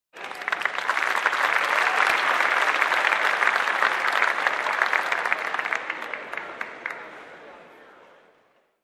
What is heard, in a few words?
Pre-performance Applause